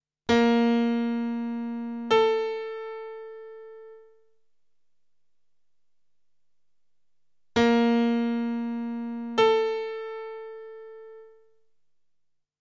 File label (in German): Intervalle Septime